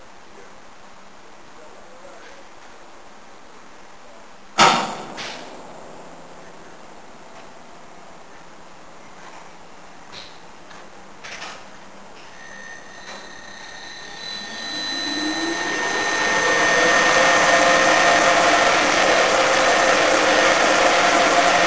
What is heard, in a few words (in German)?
1116 aufruesten, eisenbahnsounds taurus; Triebfahrzeugfamilie ES 64 U2 von Siemens; Taurus Aufrüstung